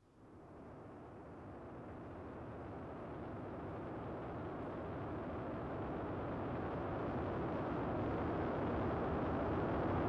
UNKNOWN HUGE CREATURE PASSED BY

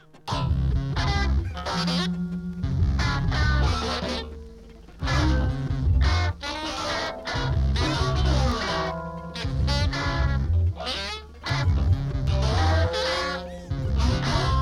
COLAPSED FUNK

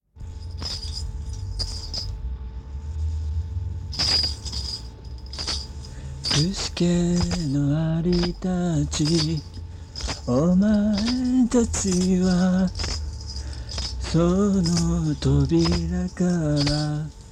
Ants walk on the door

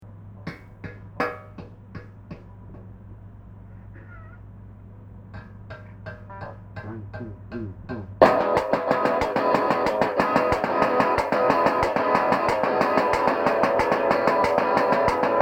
Rock'n'Roll

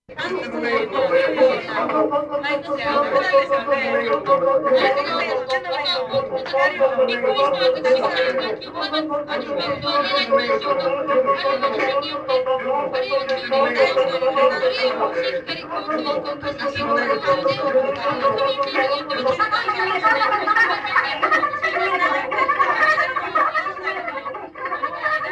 LOOPS

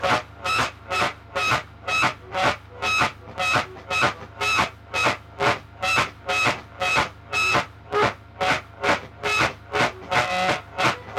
Howling Music

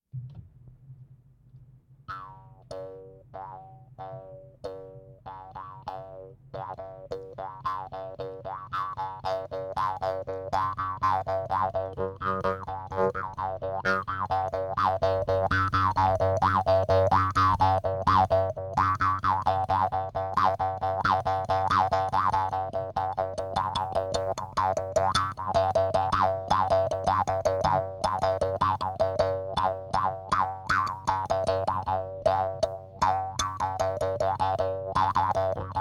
Animal Music

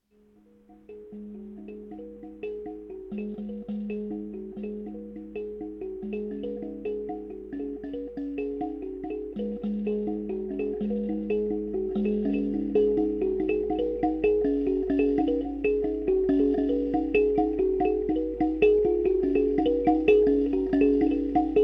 THUMB PIECE

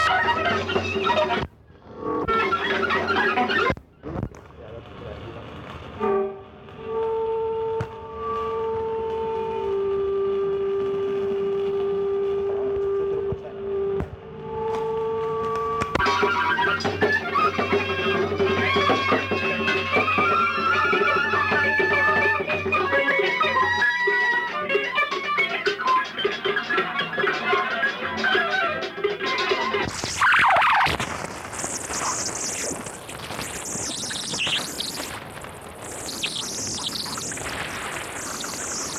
Pause Music

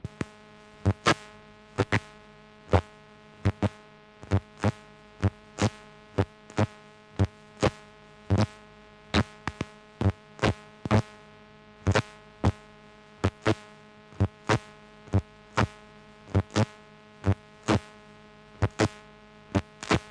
Howling Music

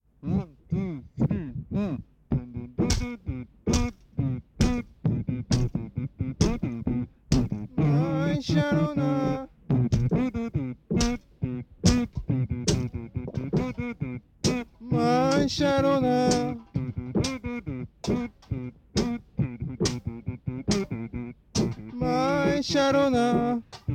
KNACK the knife (trash)

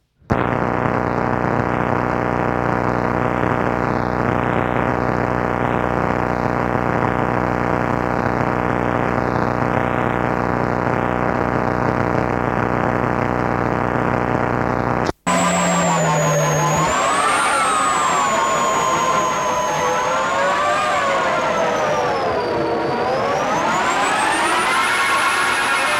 Pop

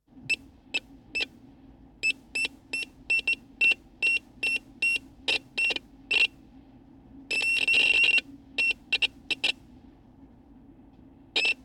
Bird Song

